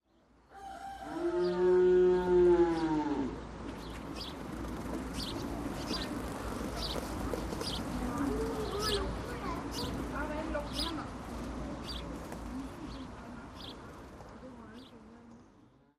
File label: Farmer Ambi; Pitch Black Audio Pong